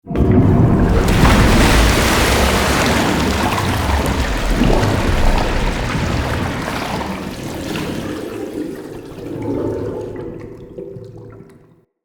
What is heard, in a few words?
Water Hazard Drain To Pipe A; Undercity